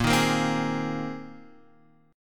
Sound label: Bb 9th Suspended 4th Bb down Guitar Half Step Down